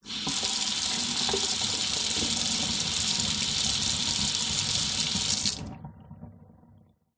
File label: household faucet on water run off short metal sink